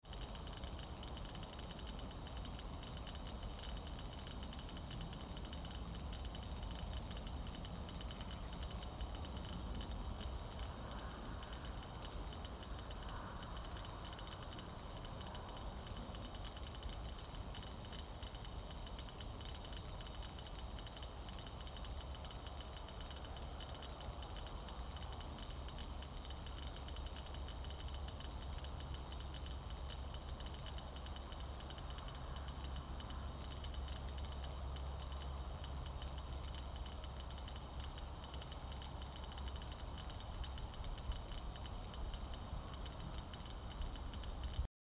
Buzzing and hissing cables in the early morning mist South Yorkshire edgelands; cables in the early morning mist, South Yorkshire edge-lands in February 2025; Andrew Conroy; Field Recordings